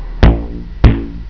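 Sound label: rubber band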